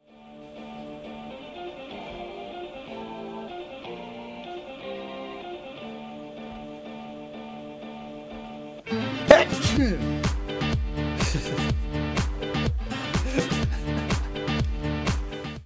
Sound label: drop the sneeze